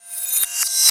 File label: Coin Reverse; FX; Cymatics; Light Free Sample Pack; Bonus Samples